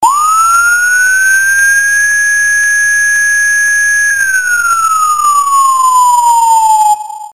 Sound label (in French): alerte; ppms attentat debut